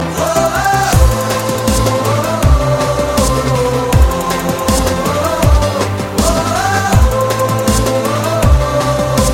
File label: Music; Jannat 2